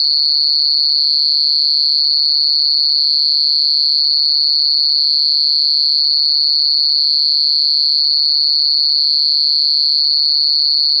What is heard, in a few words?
ear test pulse